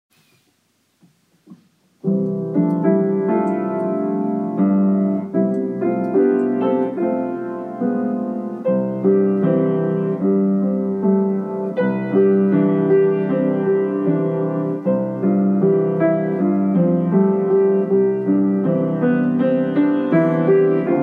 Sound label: piano Accomp